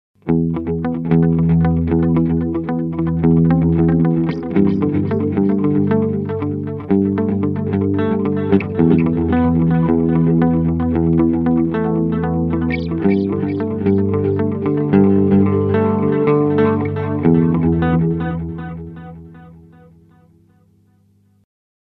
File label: Curve Echo; Meris LVX Modular Delay System